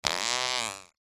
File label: human fart short thin weak